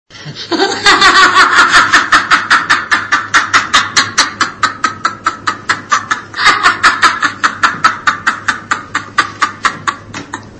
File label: hahahah